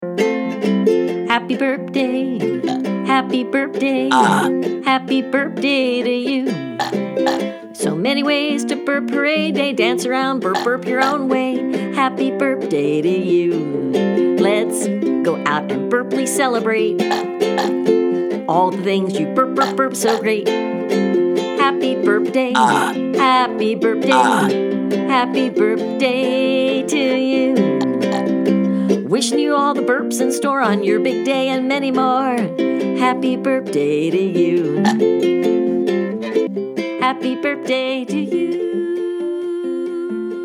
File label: burp